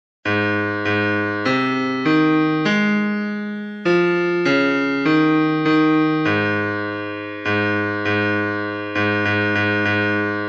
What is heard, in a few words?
Bass